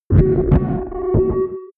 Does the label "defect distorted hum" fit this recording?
Yes